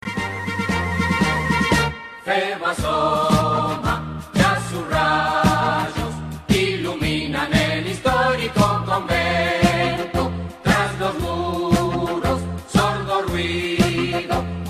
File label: Marcha San Lorenzo, San Lorenzo March